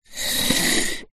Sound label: foley wood chunk small light slide smooth wood surface; zapsplat